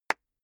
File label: clapping very slowly